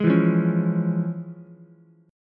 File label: F Minor Major 7th Sharp 5th Ukulele; FmM7#5 strummed; JGuitar